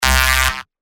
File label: Bass Shots C; Buteyko; revealed bass shots vol 3